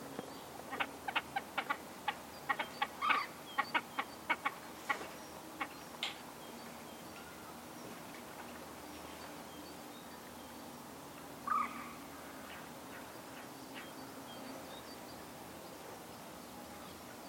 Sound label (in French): grebe huppe galinule; haute ile